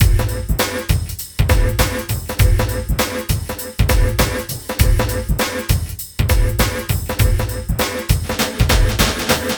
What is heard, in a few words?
JACK DIDLE 100, LOOPS